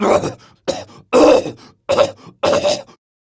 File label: Coughing; Stoic; Warframe; Evacuation